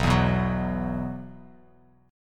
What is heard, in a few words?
B Suspended 4th, Bass Standard; Bsus4 strummed; JGuitar